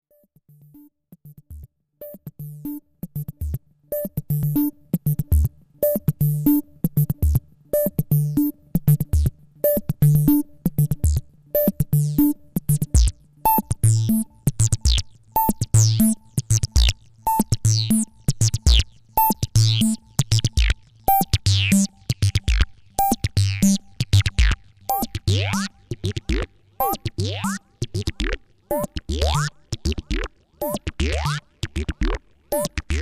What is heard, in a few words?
sequ